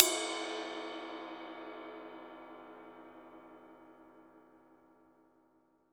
RIDE, Drum Dry; East Collexion; Drum 1 Dry

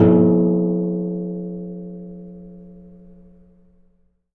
55 GALLON, ENVIRN AMB; Rarefaction; Poke in The Ear With A Sharp Stick

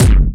HAMMER PLS, TECHNO RAVE; Pro-Rec - Synthsonic

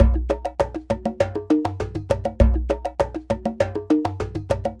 Ensemble, Latin Percussion

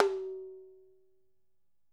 ROTOTOMS; Sonic Reality - Vintage Timetraveler

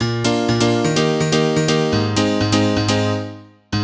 House Piano (125BPM), retro house

